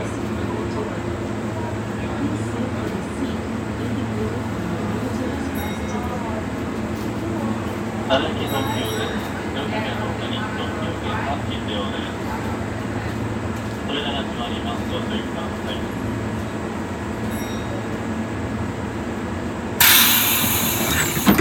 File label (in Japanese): 京都線丹波橋南発車音, train sounds; 近鉄; 近鉄京都線丹波橋南発車音